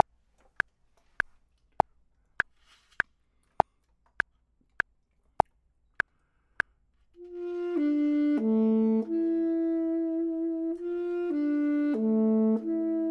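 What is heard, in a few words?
bottom, Saxophone and Clarinet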